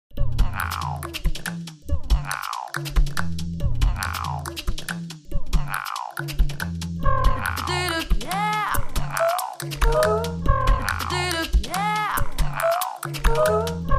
Vocals Sequence

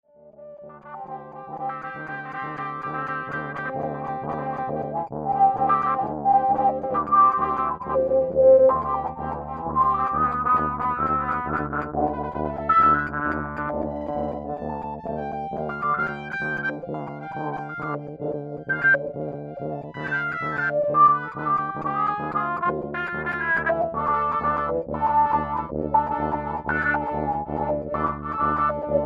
horn psychedelia

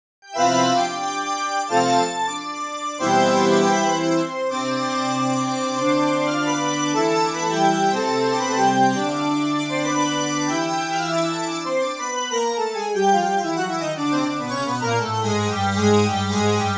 MicrOrgan fat FX impro, Reeds; Reed Organs

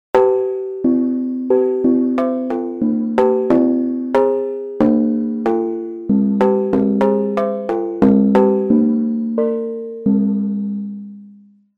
Vibrating Orient

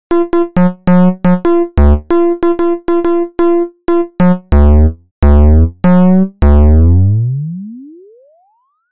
bell weird; velocity layers; Sidekick; percussions

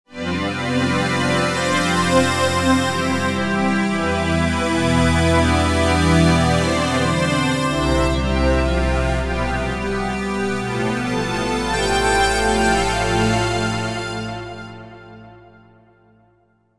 Big Strings; Cosmology

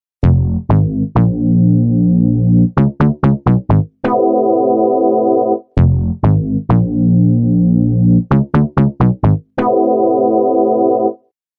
triple arptron

